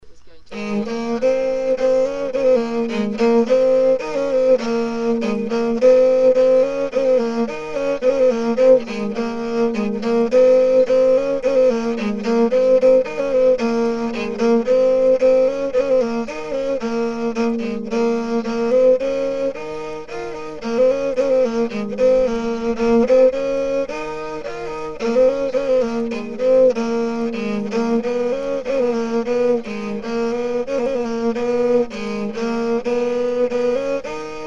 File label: Alto Jouhikko